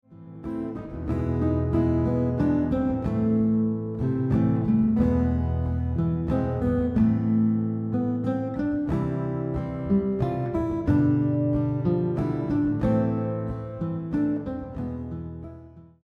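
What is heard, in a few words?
melody and chords, guitar; Fur Elise; Ludwig Van Beethoven; classical piece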